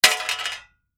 Metal Junk Impact Small, Metal hit metal parts with clanks and ra; Drop tools; Metal Sound Effects